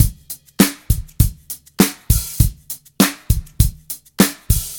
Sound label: 100 bpm drum loop f sharp key, Free drum beat - kick tuned to the F# note. Loudest frequency: 4267Hz